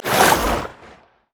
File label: launch; LoL; syndra